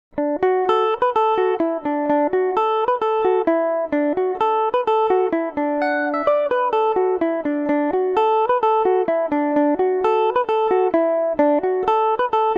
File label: fergus keanes polka dmaj, mandolin